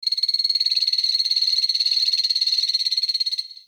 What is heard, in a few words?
Beach Night Poison Frog; Warframe; Grineer Beach